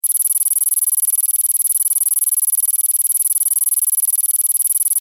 score loop; Max Steel - Match And Destroy

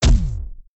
impact; Power Rangers vs Teenage Mutant Ninja Turtles - Ultimate Hero Clash 2

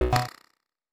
ui locked; Steven Universe - Beach City Drifters

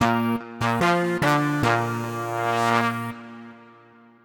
lose stinger; Nick Summer Sports Stars

